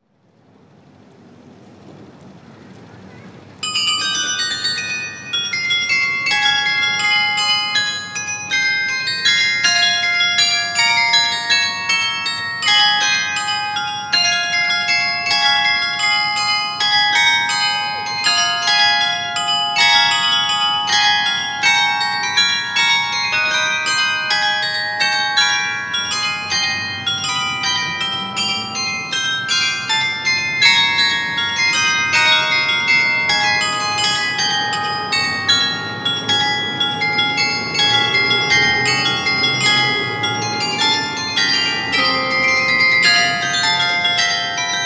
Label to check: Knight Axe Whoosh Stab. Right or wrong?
Wrong